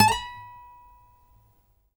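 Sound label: trill, Guitar; Quantum Leap; Guitar & Bass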